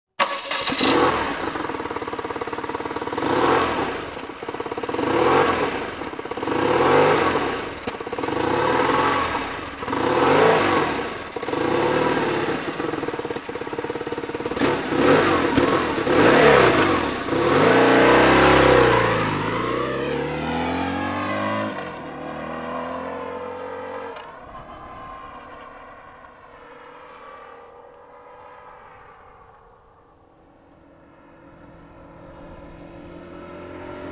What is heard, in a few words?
BMW R 1100 S